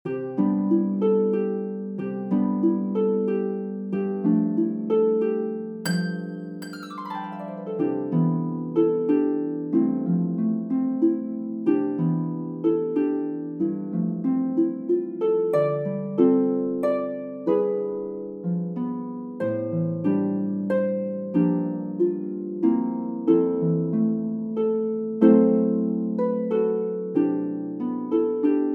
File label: pedal midi; Silent Night